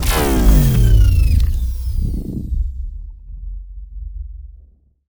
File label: archeology event power down; ancient relics